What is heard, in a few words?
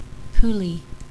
Puli